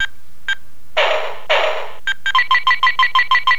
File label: 1 HIT KILL; STRIKER